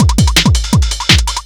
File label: Speed Loop, Drums Beats